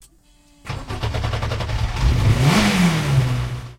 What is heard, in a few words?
Bmw E90 Starts